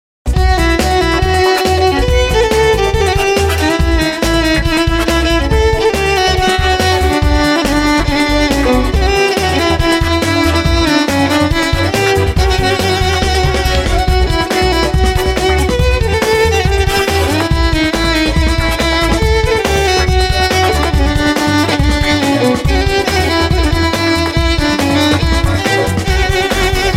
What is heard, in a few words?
sax